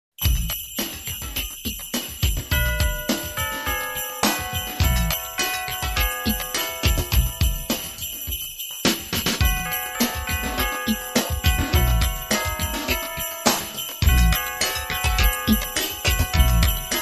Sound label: Techno Rap